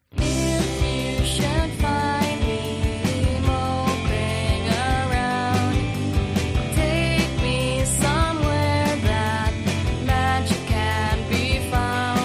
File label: Jingle